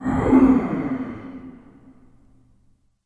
activate, zombie vision

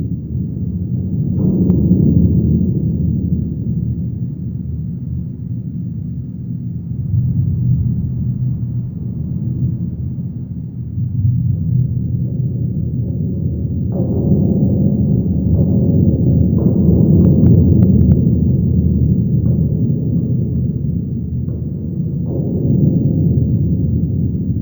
ambient bass

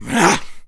zombie attack

